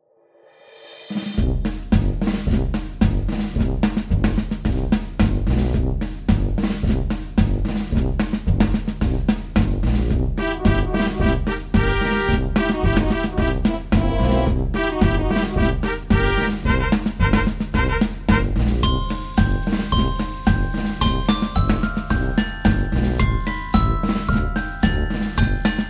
The Animals Are Singing CHIME BARS with ACCOMPANIMENT